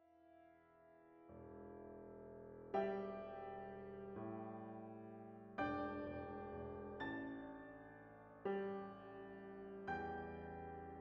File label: 4 Hand Piano